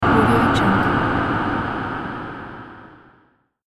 bot gas air release

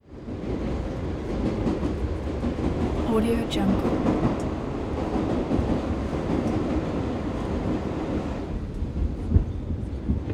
new york city el train interior ride

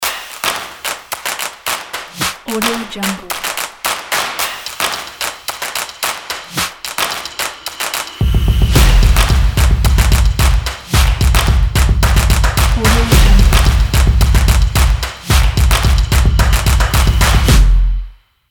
stomp logo

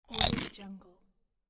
snack

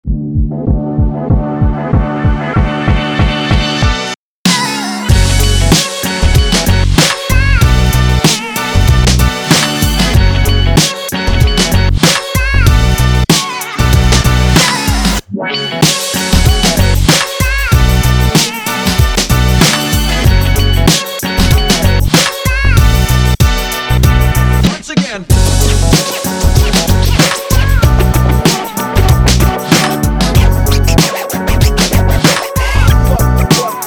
hiphop for rap

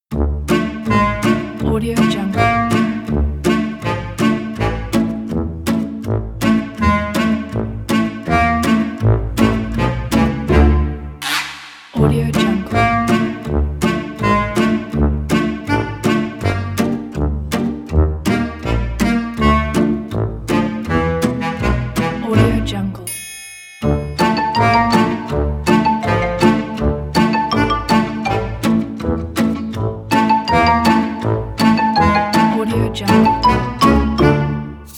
funny

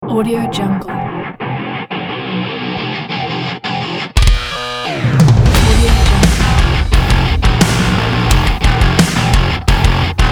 aggressive sport logo

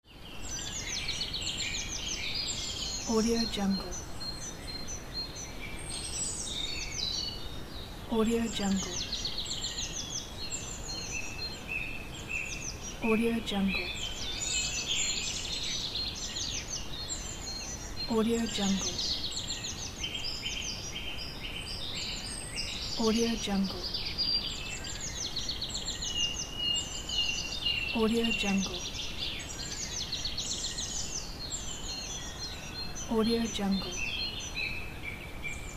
birds chirping; n-audio

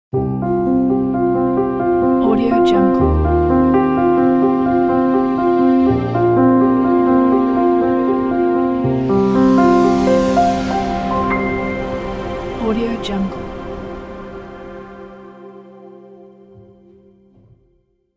cinematic piano logo

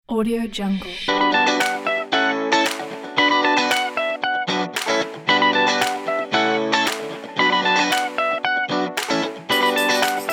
funky and driving

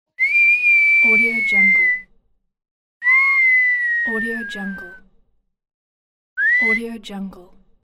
whistling

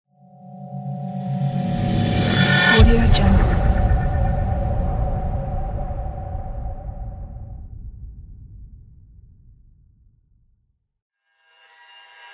reverse rise sound; sfx pack 8; archisound

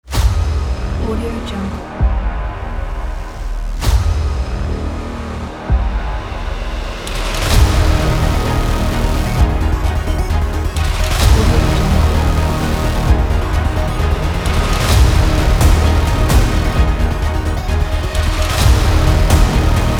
rising trailer; wildsound

